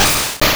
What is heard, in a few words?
Asleep, Battle Effects; P3D-Legacy